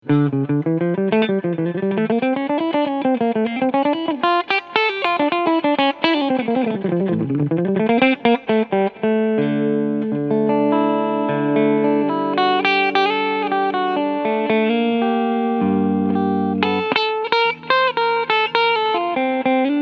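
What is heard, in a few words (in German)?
Epiphone Prophecy LP Custom Clean Sound beide Humbucker Split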